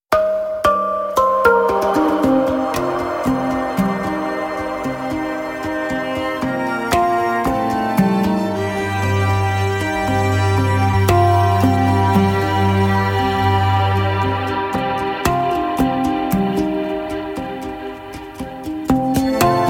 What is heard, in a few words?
Instrumental Mix